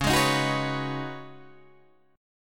C sharp 7th Suspended 2nd Suspended 4th, C sharp, down, Guitar, Open D